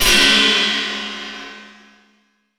TECH FX

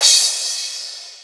Crash, Drums Cymbal; House; Crashes; Best Service; House Definition VOL-1; Cymbal Stuff

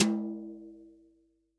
TOM, Real Drum Kit, SWING KIT; Best Service ProSamples vol.43 - Real Drum Kits

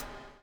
BUBL; Spectrasonics - Backbeat

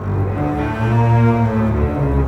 Bass Cello, Cinematic; Sonic Foundry (Sony Creative Software); Orchestral Series VOL-3 - Cinematic

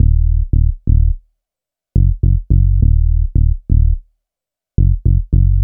Sum Bass, Drum n Bass; KJ Sawka; Drum n Bass & Beyond

